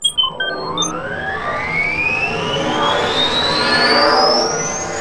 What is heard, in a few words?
warp start; Earth and Beyond; EA Games